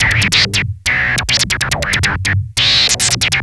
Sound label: Treat Guit, Industrial; Nasty